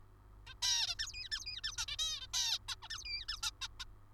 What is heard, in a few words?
HVCx lesioned juv at ad